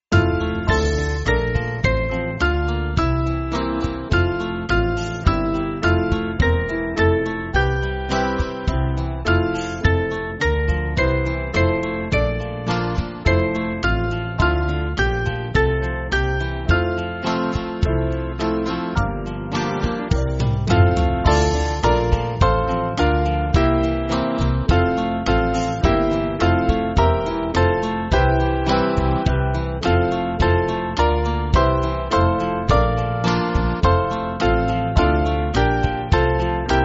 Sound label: Band, church music